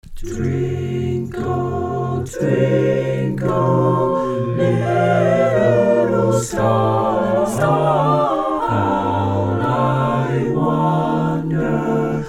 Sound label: All Parts, barbershop